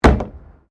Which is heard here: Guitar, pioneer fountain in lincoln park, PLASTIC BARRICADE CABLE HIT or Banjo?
PLASTIC BARRICADE CABLE HIT